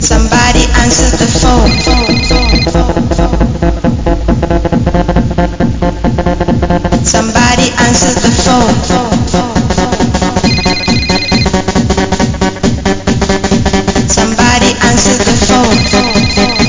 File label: Funny ringtones for android Phone